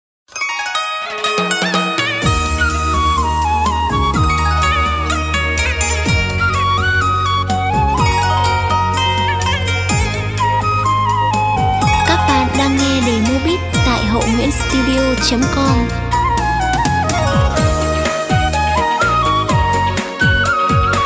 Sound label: beat